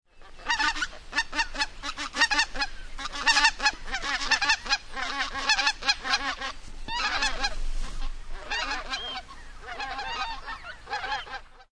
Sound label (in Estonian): suurlaukhani; autumn